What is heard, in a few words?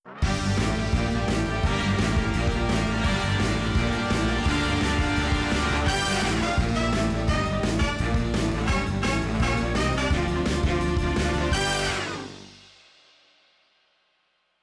Closing Theme Music; ocean-commotion